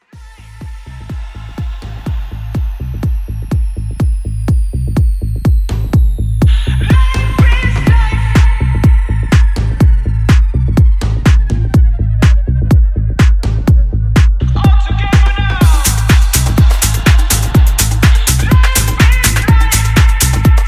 Tech House Intro; Remixfreakz

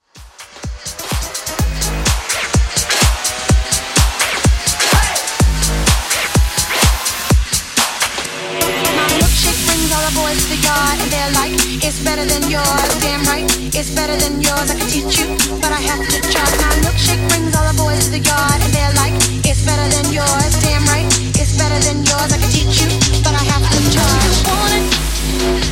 house bootleg 126 bpm

